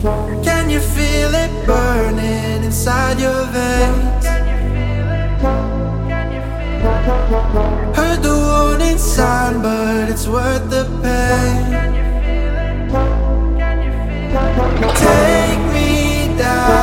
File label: Construction Kit Full Mix